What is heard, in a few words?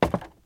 wood; firefight